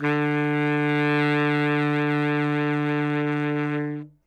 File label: sax, notes; Dirt-Samples